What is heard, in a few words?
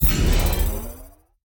Boost Pad Deploy